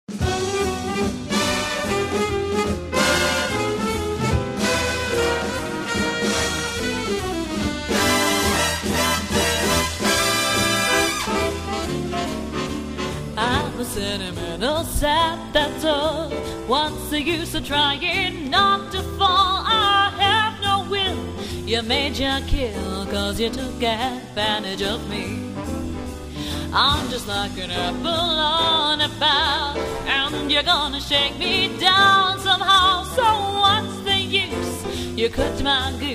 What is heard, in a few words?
Jazz